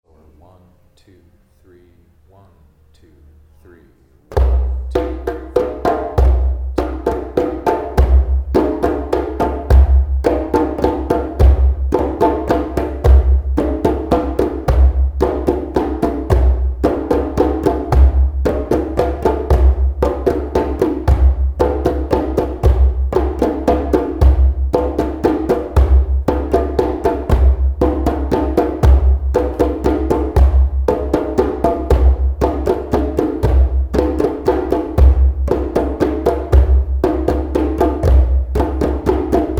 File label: 3-4 pattern, drumming